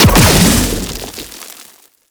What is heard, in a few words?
Treasure Box Proj Explosion; Warframe; ManInTheWall